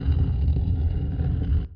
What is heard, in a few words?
ice; Pong